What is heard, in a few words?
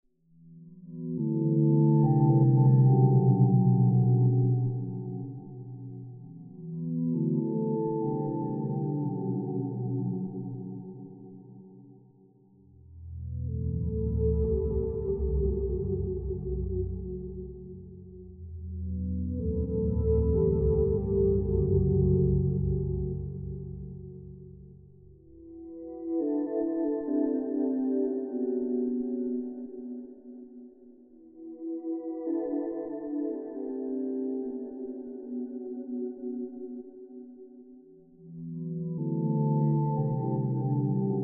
Reverb Through Temple, dark ambient